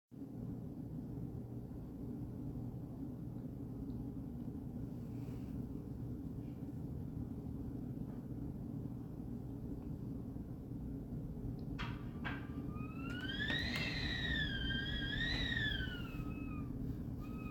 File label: high cat wind; Tofte MN; noise diagnosis